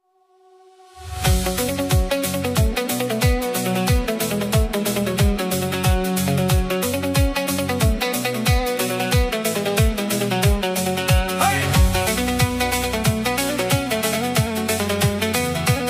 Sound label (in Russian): lezginka pod zvuki pandura